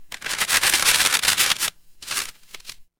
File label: paper fabric crumple